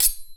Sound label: General Button; netent